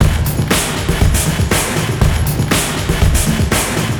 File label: Drum test wet; Nimbus Station; lego universe macos windows gamerip 2010